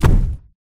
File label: footsteps; huggy nap